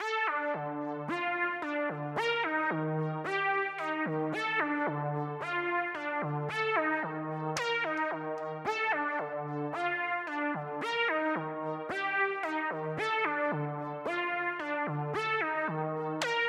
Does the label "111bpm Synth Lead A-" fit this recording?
Yes